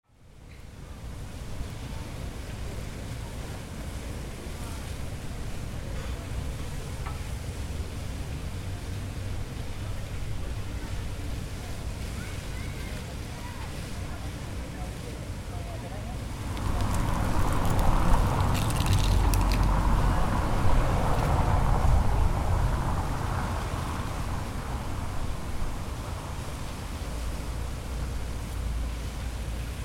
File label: Street Ambience